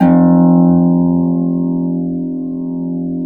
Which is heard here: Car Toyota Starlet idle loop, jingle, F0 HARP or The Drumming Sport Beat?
F0 HARP